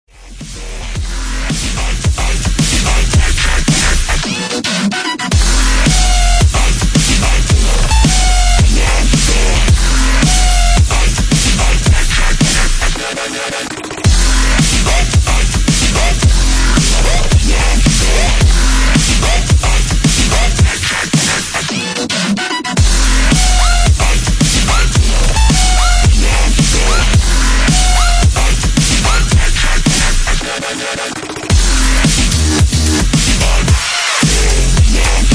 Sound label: remix